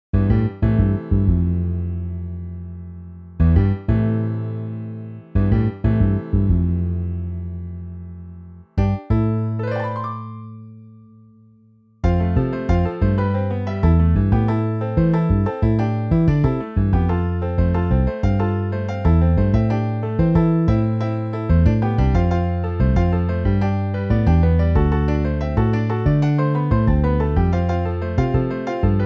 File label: Piano Bass MIDI; IRE PRODUCTIONS